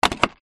transport car plastic bottle place in drink holder